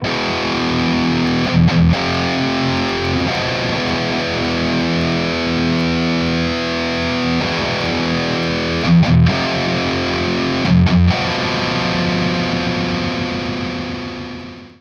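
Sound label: heavy distortion bridge, guitar; Guild Surfliner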